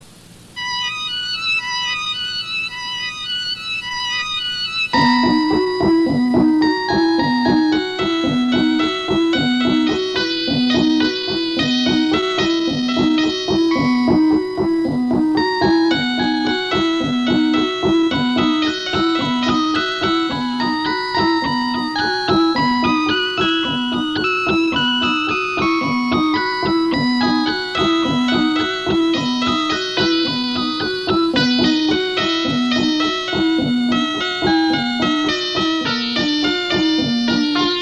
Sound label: Instrumental